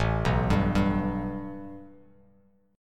A 7th Suspended 2nd Bass Standard; A7sus2 arpeggiated; JGuitar

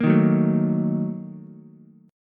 Bb Major 7th Suspended 4th Sharp 5th, Ukulele; 3,3,2,0; strummed

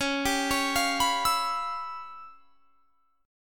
Db 7th Sharp 9th Flat 5th Db down, chord shape; Db7#9b5 arpeggiated; JGuitar

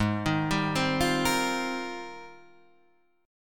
G sharp Major 13th, Guitar, Standard; 4,6,5,5,6,6; JGuitar

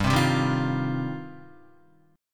G 7th Suspended 4th Sharp 5th, Guitar; G7sus4#5 strummed

